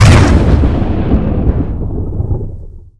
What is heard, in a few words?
ground slam, golem; quakemapping